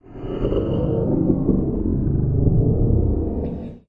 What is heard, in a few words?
dark under wood fx; Electro and Synthetic